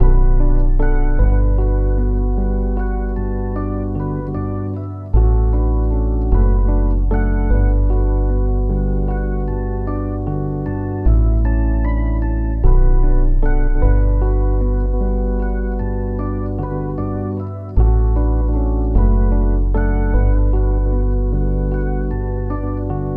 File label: Kit Full Bm 76, Lo-Fi; Melody Kits; Gone